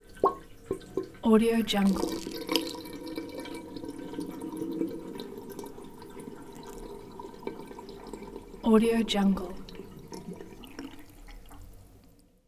sink drain

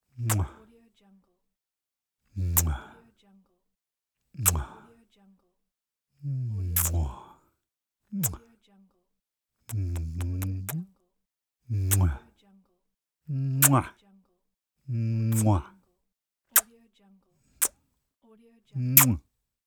male kisses

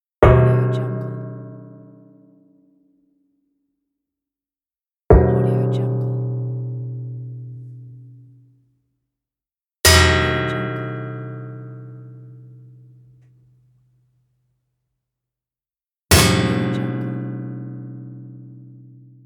metal sheet hit

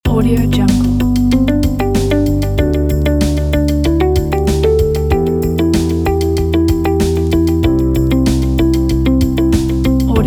corporate promo loop

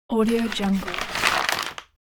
paper grocery bag

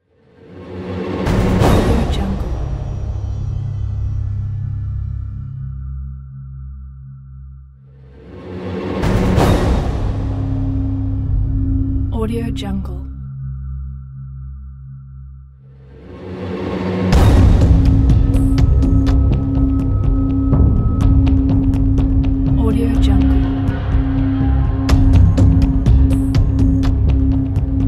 horror trailer

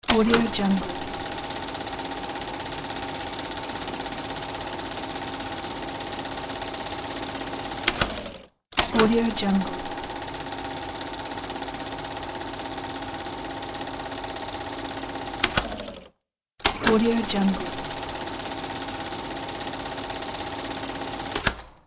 film projector reel

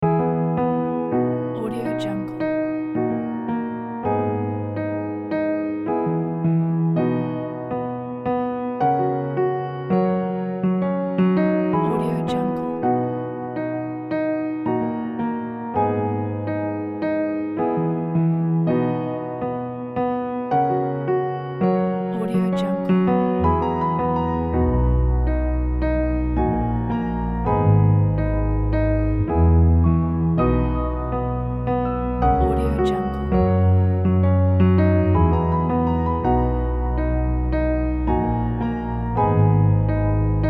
sentimental piano

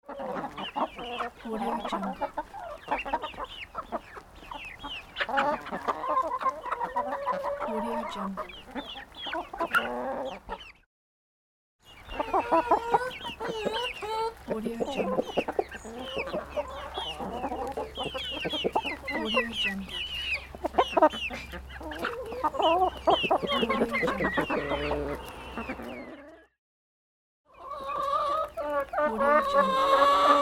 chickens

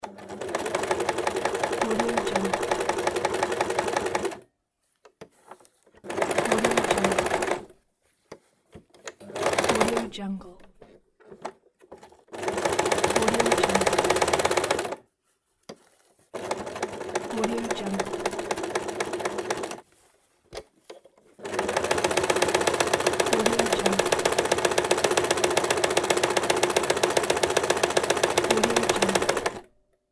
sewing machine

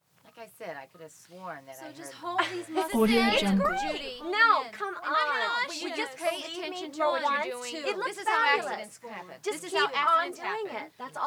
teen girls in gym constant small group

